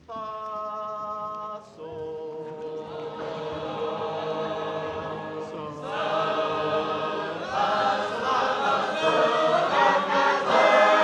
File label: Gospel Trumpet, sacred harp